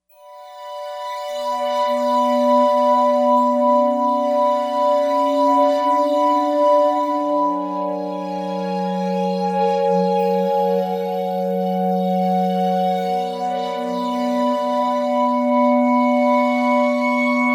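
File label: Apollo, synth pad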